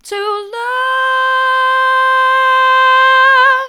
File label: to love hard, vocal